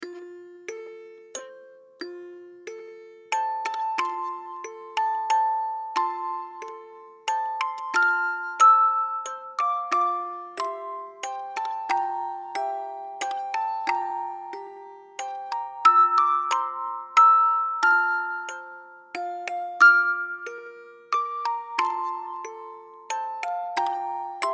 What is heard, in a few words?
Baby sleeping music brahms lullaby music box